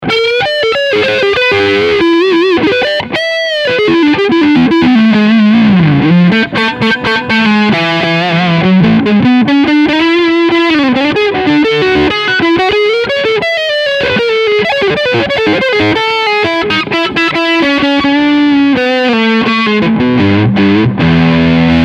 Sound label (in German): Leadsound Front Boost